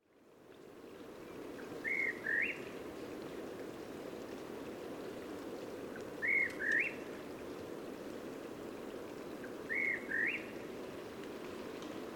antrostomus saturatus; Irazú; historia natural

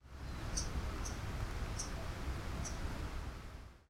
Amazilia tzacatl, Cantos; exhibicion jardin; conservacion naturaleza

